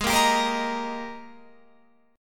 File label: Ab Major 7th Suspended 2nd Ab Guitar Open F; AbM7sus2; 20,17,19,17,18,17; strummed; chordshape; JGuitar